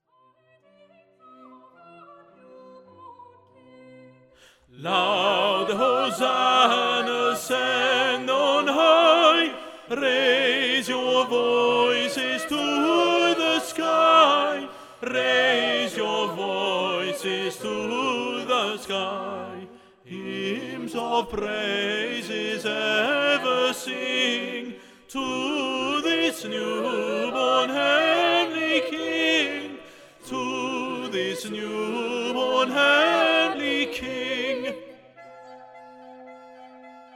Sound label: Tenor Up, While shepherds watched their fleecy care